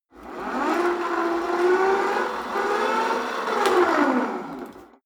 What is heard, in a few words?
rope trainer pull sequence medium, fitnessstudio; sport freizeit